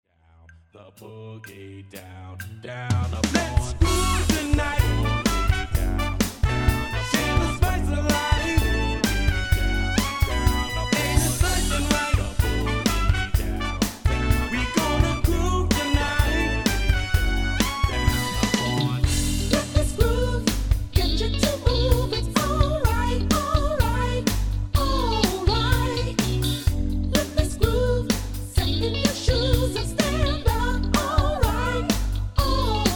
soulful rnb; surReal

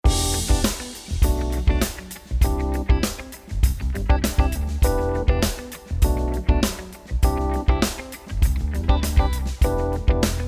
smooth groove; surReal

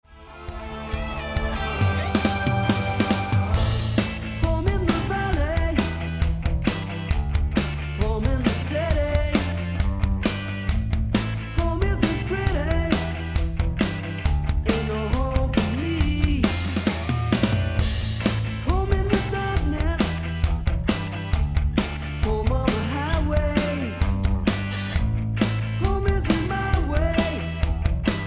power acoustic; surReal

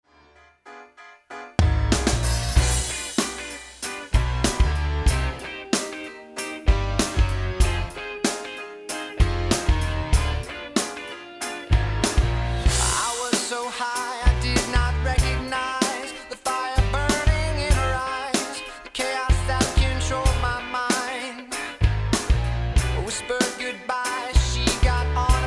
closet lofi; surReal

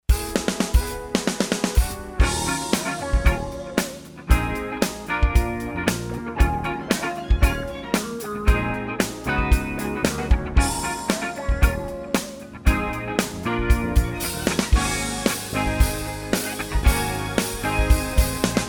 booth soft; surReal

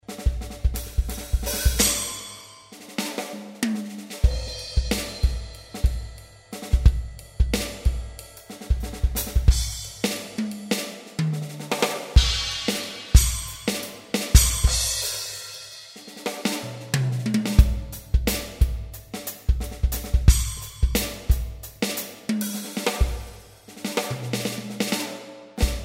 soft long; Roland TD-30, 20X. 20 & 12; Top 50 Drummers 1 Expansion Pack; Peart

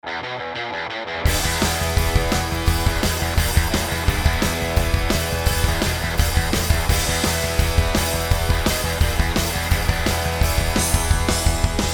country; surReal

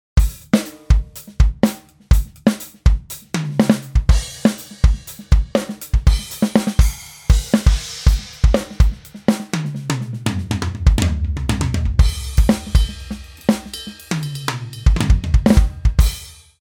ROSE DRUM; VaultX

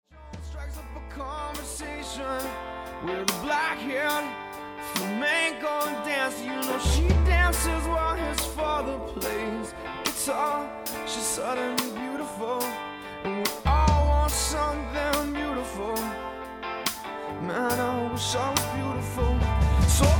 unplug heavy; surReal

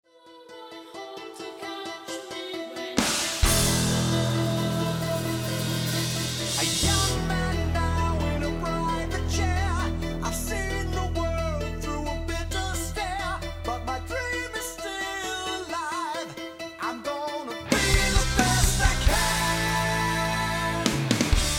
fidelity prog

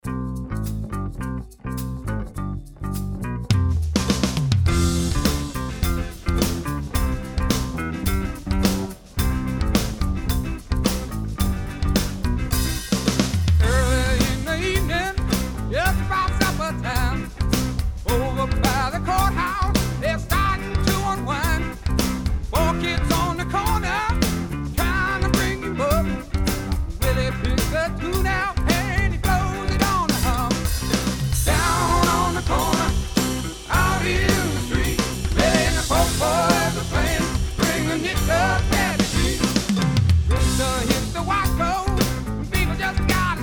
live heavy; surReal

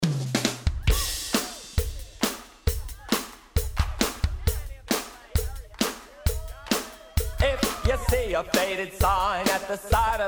studio tight; surReal

